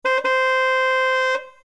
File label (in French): Klaxon voiture